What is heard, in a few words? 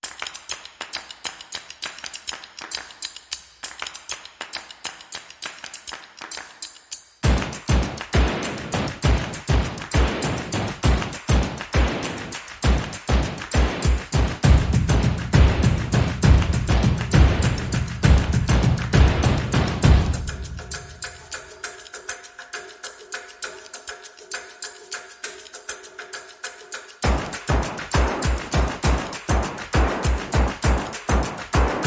No Nylon Guitar No Vocals